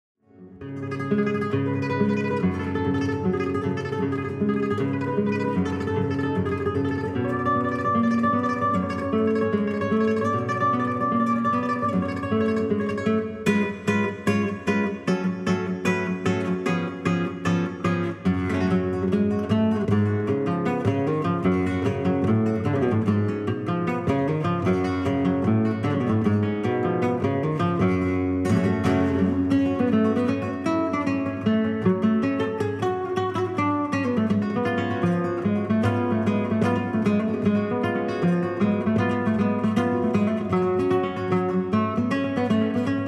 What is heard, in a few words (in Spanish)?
Farruca, trad